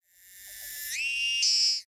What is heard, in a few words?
electric cicada